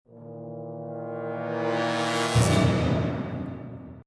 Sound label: compressor after; SFX; SoundsCrate